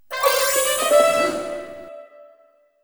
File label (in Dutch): Trompet; the blob de blob utrecht